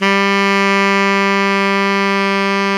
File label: SAX TENOR, Super Sax Tenor mf; Roland LCDP07; Tenor mf&ff